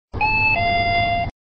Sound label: ringtone alert